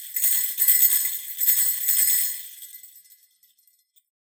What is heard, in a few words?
christmas tree bells; soundscrate